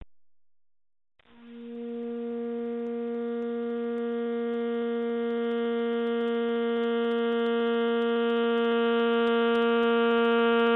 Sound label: long tone, sax